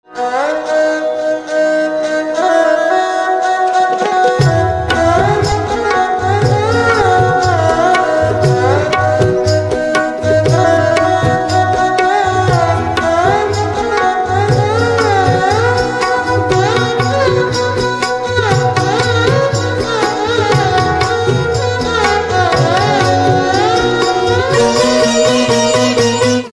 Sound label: Violin Instrumental Ringtone